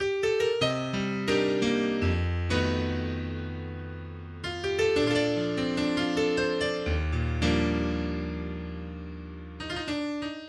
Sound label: solo piano